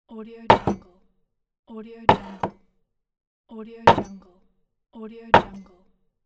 glass put down

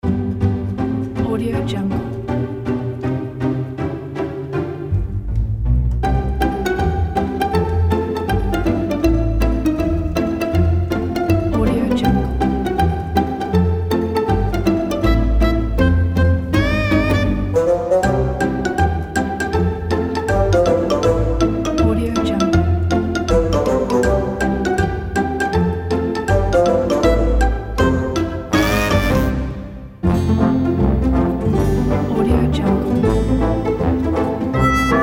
happy comedy; sonicpulse

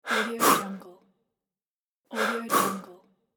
man sigh

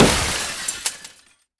explo glass; matter mayhem; soundmorph

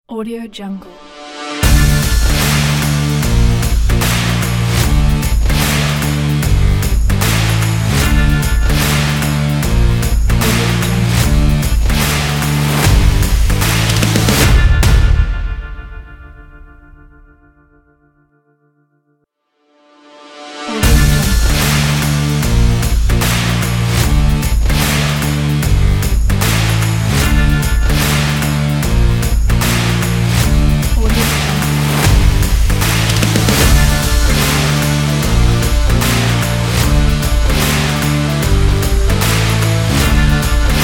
action sport rock opener logo; welkinlab

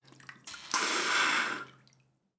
whale spouts

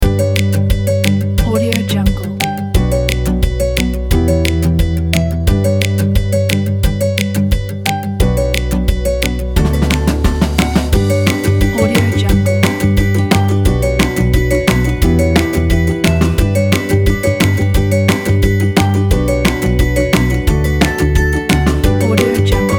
cheerful

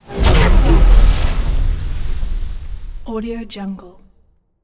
attack defense raven tech charge impulse; robotic lifeforms 2

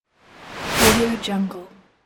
slide

